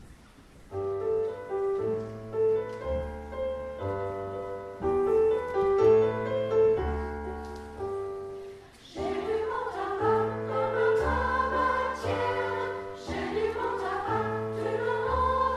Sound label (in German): Chor und Jubilaeumschor Traditional; Konzertmitschnitt vom 2. Juli 2024; Jai du bon tabac